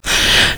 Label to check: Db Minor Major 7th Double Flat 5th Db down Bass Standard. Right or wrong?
Wrong